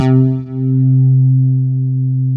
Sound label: ANALO BAS, ANAL BASS